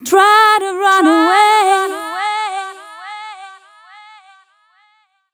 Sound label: f 140 mel b-flat, fem Vocals